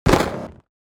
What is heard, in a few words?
Fight combat game menu ui error